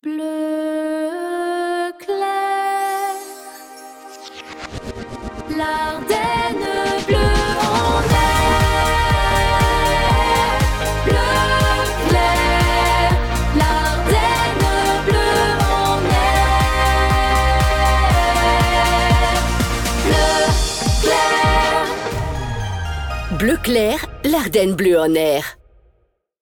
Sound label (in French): Jingle Chante Theme ID; BleuClair